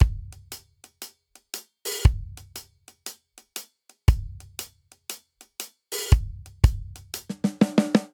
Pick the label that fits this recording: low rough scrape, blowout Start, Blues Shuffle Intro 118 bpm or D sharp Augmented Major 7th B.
Blues Shuffle Intro 118 bpm